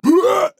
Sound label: Death; Paladins; Shaman